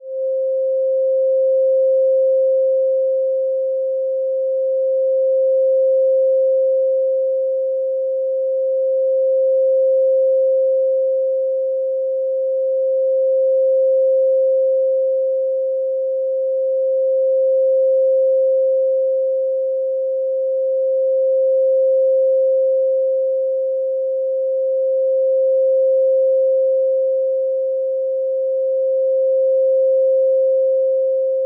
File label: pure wave, 528